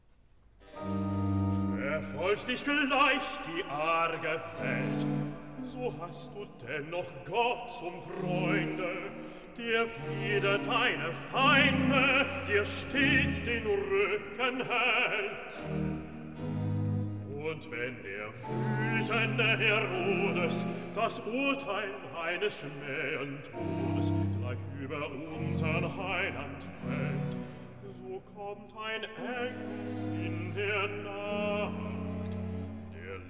Recitativo Bass; BACH VOCAL; CANTATAS; BWV 58; Ach Gott, wie manches Herzeleid; Verfolgt dich gleich die arge Welt

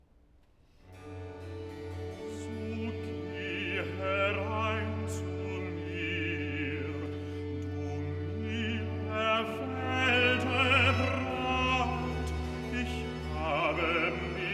Recitativo (Bass); BACH VOCAL; CANTATAS; Wachet auf ruft uns die Stimme; So geh herein zu mir

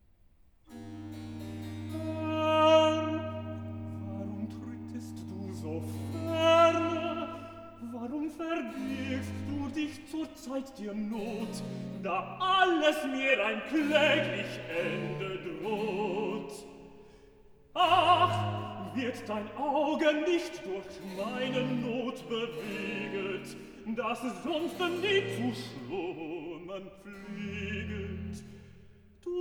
Recitativo (Tenor); Herr! warum trittest du so ferne; Bach; cantatas; BWV081; Jesus schlaft, was soll ich hoffen

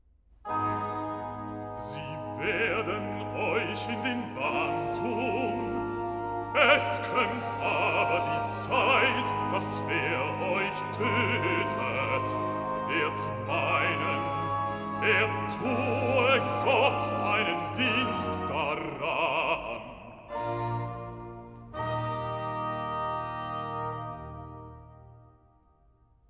Recitativo Bass; BACH VOCAL; CANTATAS; BWV 183; Sie werden euch in den Bann tun

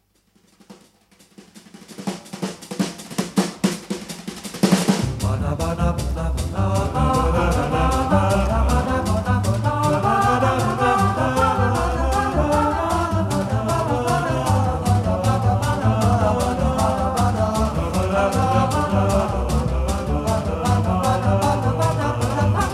Invenzione a 2 voci N. 1 in do magg.; BACH; BWV 772

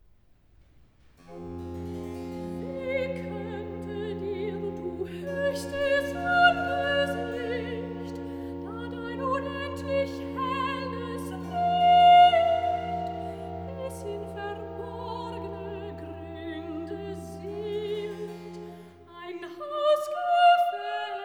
Recitativo Sopran; BACH VOCAL; CANTATAS; BWV 194; Hochsterwunschtes Freudenfest; Wie konnte dir du hochstes Angesicht

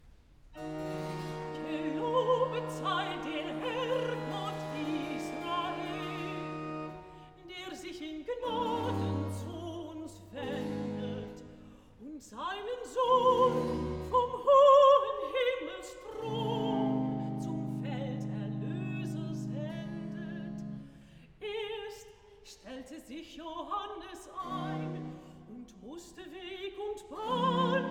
Recitativo (Alto); BWV 167; Ihr Menschen rьhmet Gottes Liebe; Gelobet sei der Herr Gott Israel

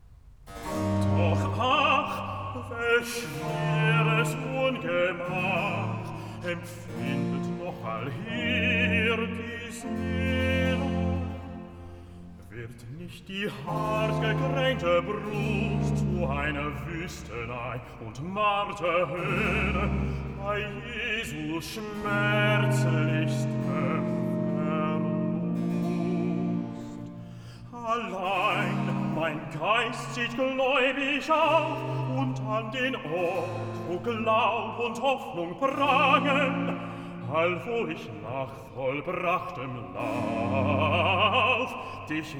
Recitativo (Bass); Bach; Cantatas; Meinen Jesum lass ich nicht; Doch ach! Welch schweres Ungemach

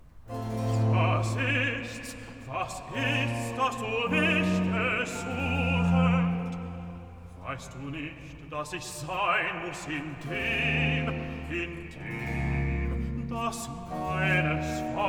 Recitativo (Bass); Bach; Cantatas; BWV032; Liebster Jesu mein Verlangen; Was ist-s dass du mich gesuchet

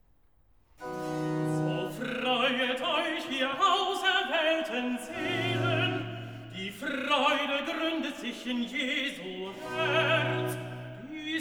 Recitativo Tenor; So freuet euch ihr auserwahlten Seelen!; Bach; Cantatas; BWV 184; Erwunschtes Freudenlicht

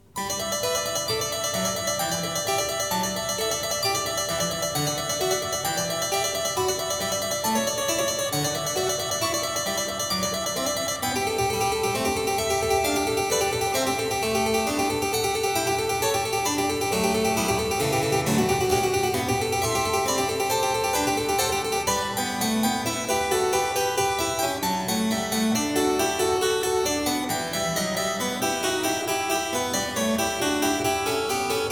Variatio 28 a 2 Clav.; BACH; GOLDBERG VARIATIONS

